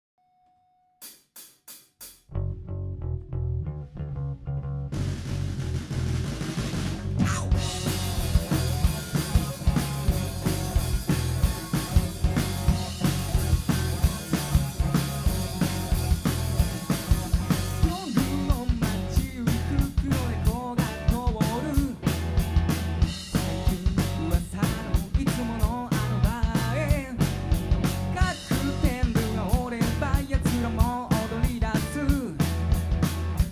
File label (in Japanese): ブギー・ウギー (Live Short)